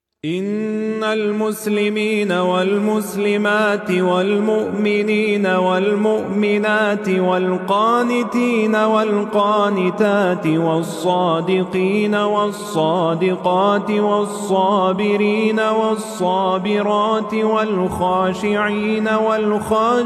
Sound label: Call to prayer, Arabic; Gaza